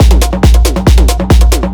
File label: drum, 138, drum-loops, percussionism, Techno; Techno ID